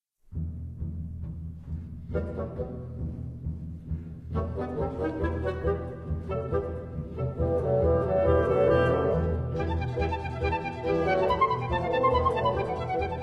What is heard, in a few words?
Piccolo Concertos; Moto perpetuo Op. 8; Poltz; Mulsant; Liebermann